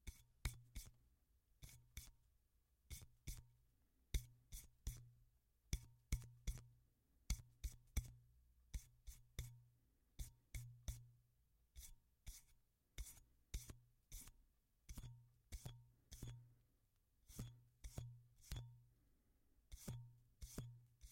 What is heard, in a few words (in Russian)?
zvuki podzornoi truby